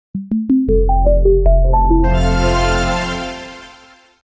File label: a short 5 second jingle; streaming